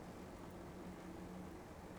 pulses, BALAENOPTERA; MONACO 2022-07

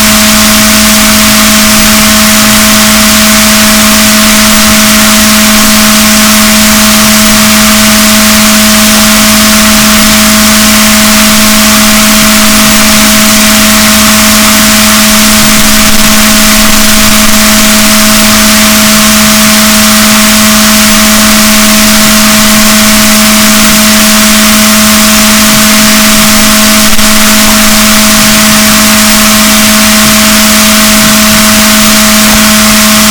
rorquals negatif, boat

